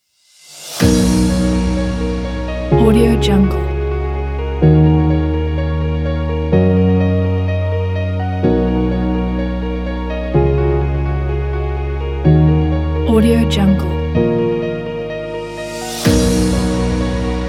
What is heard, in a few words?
progressive house